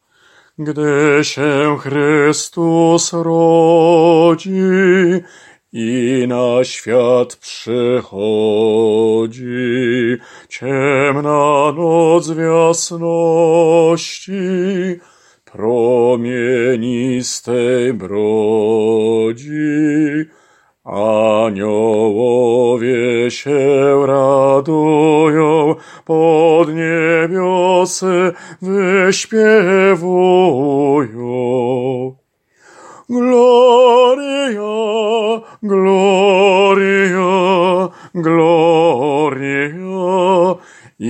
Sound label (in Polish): bas; Gdy sie Chrystus rodzi